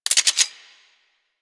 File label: Tommy Gun Full Auto Switch; Weapons; Warframe; Tenno